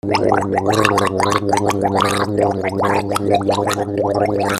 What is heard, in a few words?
BATHROOM